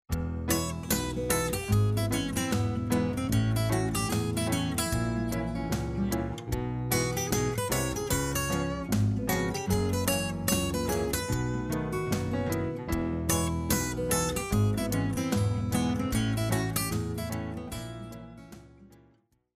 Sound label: country